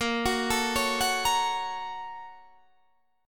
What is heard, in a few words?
Bb Minor Major 7th Sharp 5th